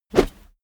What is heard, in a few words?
whoosh heavy fast; swooshes-swishes